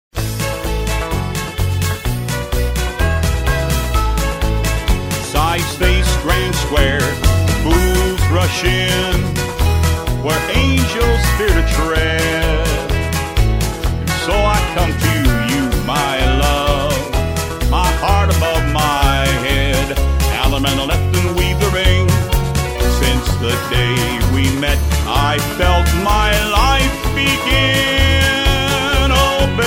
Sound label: vocal